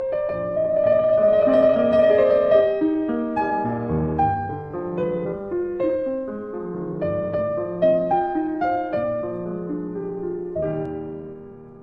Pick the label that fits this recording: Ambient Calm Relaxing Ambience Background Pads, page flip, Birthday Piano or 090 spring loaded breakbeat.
Birthday Piano